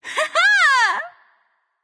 "yay"; smite; nuwa

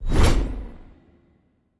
giant fire swing; smite; vamana

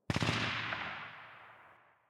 landing gun burst; smite; Ares

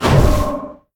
air disc; smite; kukulkan

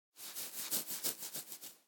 Idle Scratch; Smite; Susano

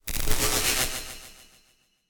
electricity; smite; merlin

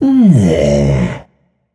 tongue; smite; hebo

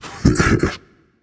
heh heh; Smite; cabrakan

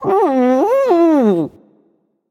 growls

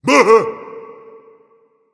surprised, voice; Smite; ymir skin6

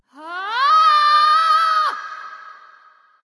battlecry light; smite; voicepack; hel

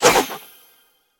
fire; smite; god raijin

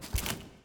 phoenix step; smite; npc

